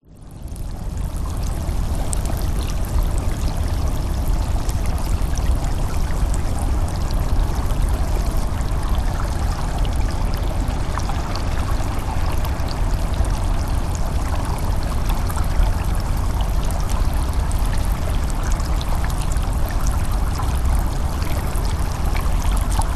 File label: Stream Knife Current Detail Sharp Bright Trickling Drips Flowing Underwater; Dive Deep; lukas tvrdon